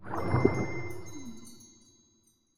发光鱿鱼环境声

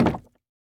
竹木栅栏门开关声

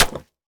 泥砖破坏声

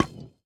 饰纹陶罐 插入 失败声

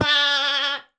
山羊尖叫受伤声

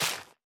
大型垂滴叶向下倾斜声

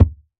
泥坯踩踏声

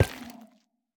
幽匿催发体踩踏声

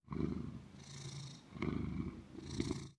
猫打呼噜声

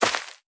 缠根泥土踩踏声

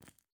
蜡烛环境声; ambient

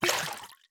玻璃瓶倒空声

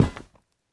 宝库踩踏声

